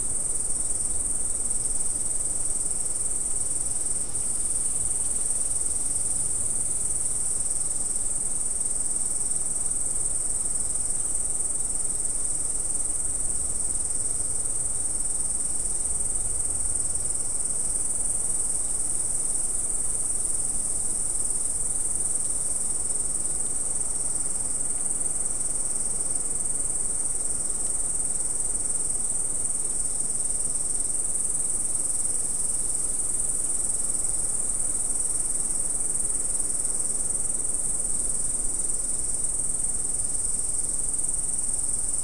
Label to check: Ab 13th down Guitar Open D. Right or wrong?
Wrong